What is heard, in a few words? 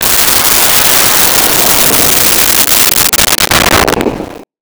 Missile, Weapons; 1001 Sound Effects